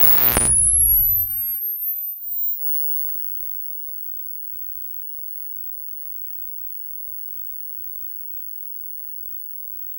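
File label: old cathode ray tube monitor, powering on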